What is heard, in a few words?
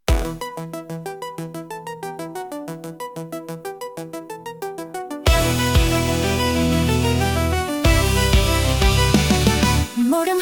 80s Pop Rock